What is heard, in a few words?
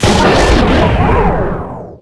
death; minibot